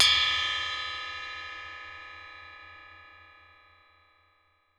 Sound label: metallic, water fx